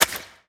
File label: butter land